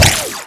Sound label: imp bullet lightballistic shieldwall; Titanfall 2